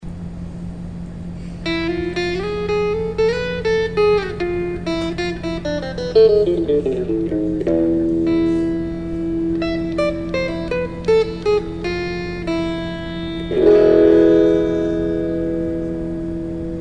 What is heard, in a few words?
metal flamenco